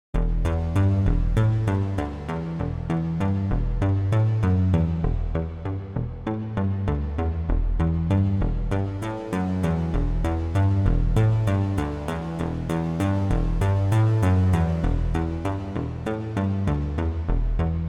Eventide SP2016 Room Modern

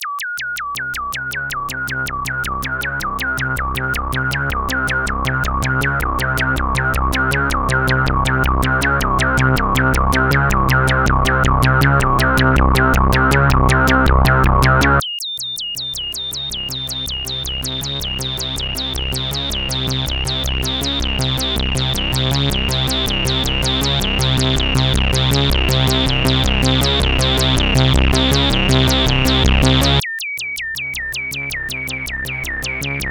Ladder Seq dist

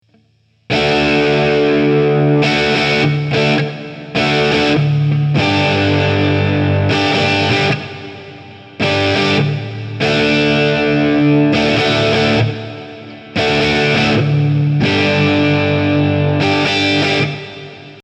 TC8210 Vibraphone

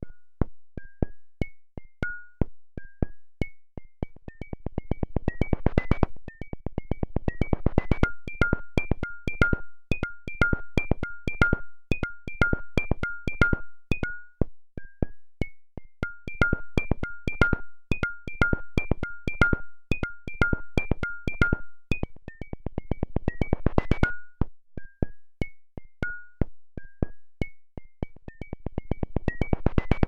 hand drums

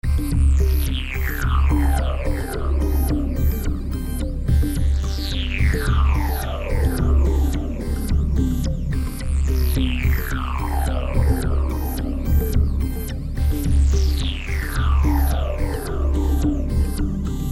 Bone Drum

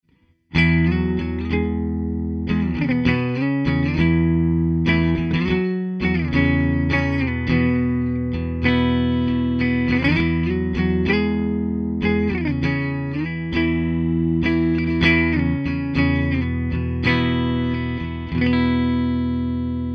Guitamp clean; Avantone CK7

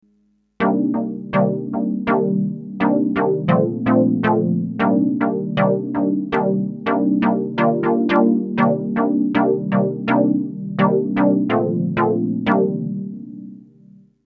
Roland JX 08

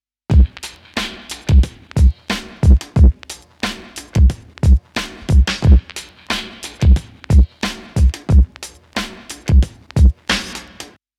Loop EQed

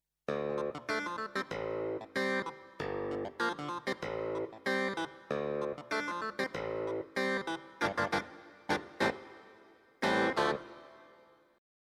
CP4 Stage Clavinet

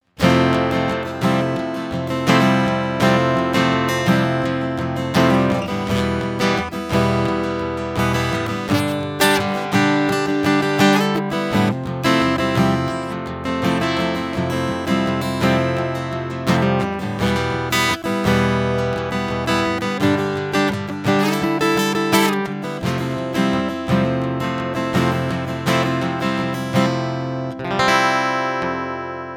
PRS SE A20E Strumming Line

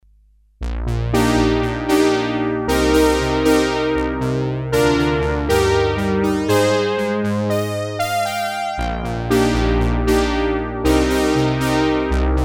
P600 Pan Polysynth

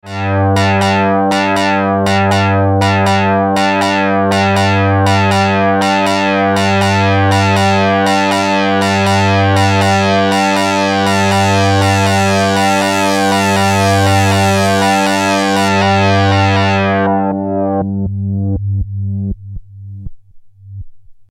Laserharp modified; Xerxes

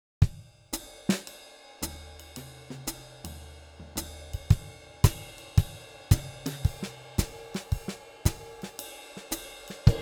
Jazz

